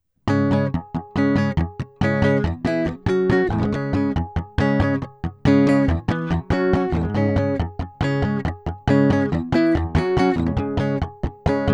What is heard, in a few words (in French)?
gtr seule; little labs; ibp; little labs ipb